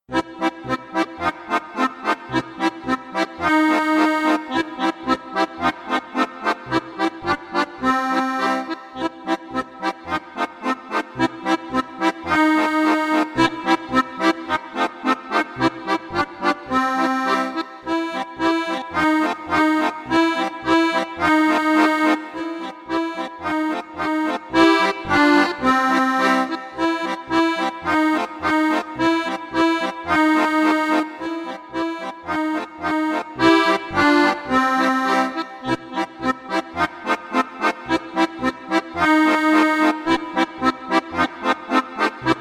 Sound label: Galloping Horse Duet